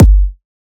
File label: Kick, Drums